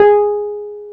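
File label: piano Wurly; Keys of the 60s and 70s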